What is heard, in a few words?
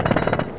man building far; Bolo